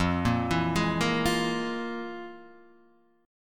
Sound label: F Minor Major 7th Double Flat 5th, Guitar, DADGAD